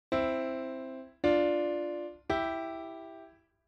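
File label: thirds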